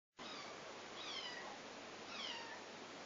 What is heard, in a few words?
kitty voices